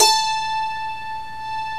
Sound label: DULCIMR KOTO; OMI - Universe of Sounds